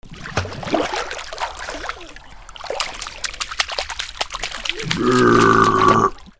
ANIMALS

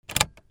CARS

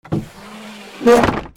DOORS

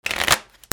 CASINO

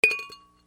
OFFICE

